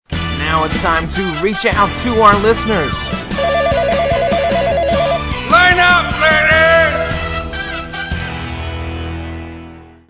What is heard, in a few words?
Phone Call Intro